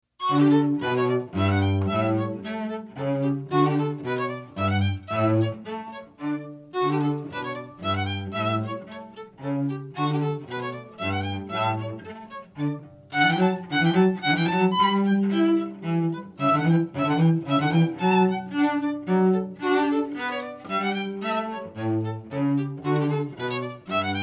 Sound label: Telemann duet